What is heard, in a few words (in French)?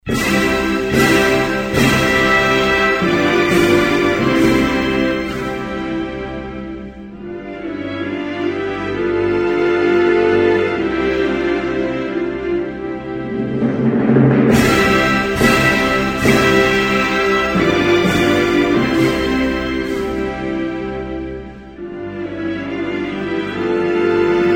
Croatie, Hymnes nationaux